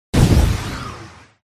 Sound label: hydra buff